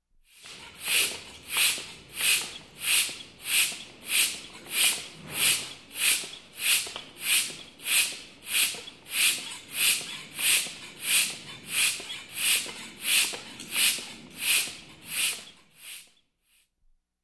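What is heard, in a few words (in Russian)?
воздушный насос ручной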